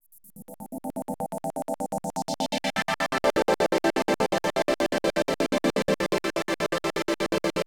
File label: SYNTHPAD HOUSE 125 A; eJay; Sphere